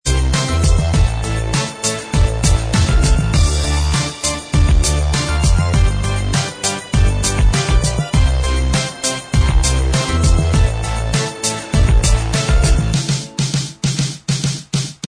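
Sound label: instrumental, backing tracks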